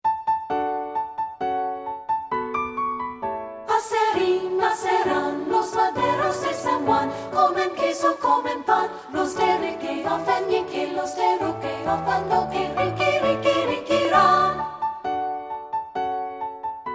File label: Spanish Folksongs; Riqui, Riqui Riquirrán